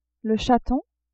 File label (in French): chaton, animaux